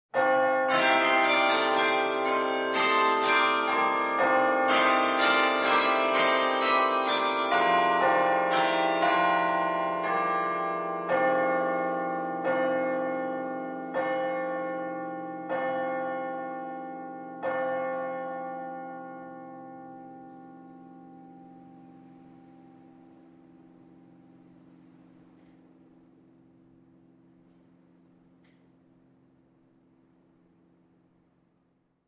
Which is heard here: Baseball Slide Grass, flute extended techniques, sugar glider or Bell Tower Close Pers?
Bell Tower Close Pers